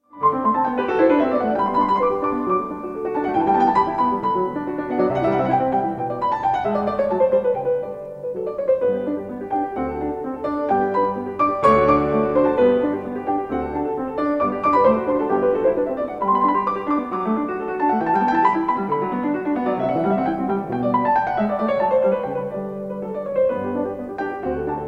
sonata for 2 pianos in D major 3rd movement allegro molto; wolfgang amadeus mozart